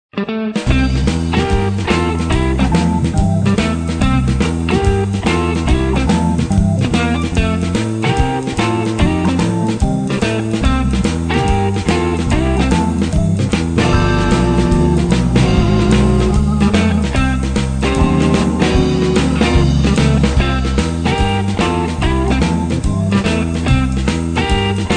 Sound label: shuffle